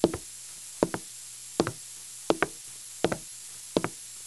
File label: FOOTSTEP